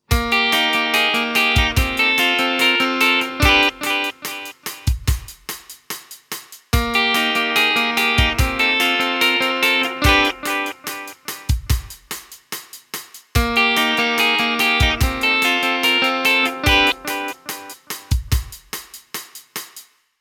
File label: Clean